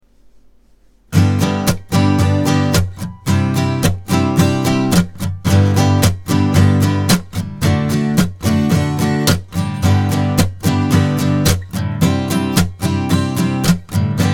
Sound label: Chord Inversions, guitar